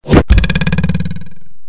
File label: knife; openspace3d; kidkitchen